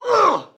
man injured grunt; soundscrate

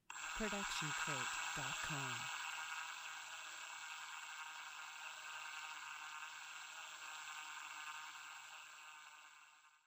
drill slow long; SoundsCrate

